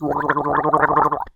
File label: man gargle; human